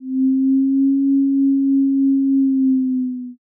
erhu

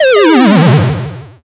powerdown

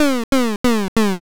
laugh single

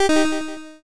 cant buy

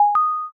quick press

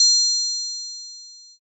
triangle

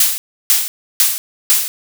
leaf step

